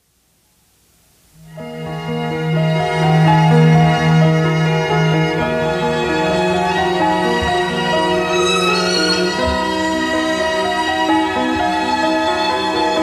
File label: leaves strings